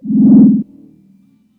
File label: warp, power Up Down Sounds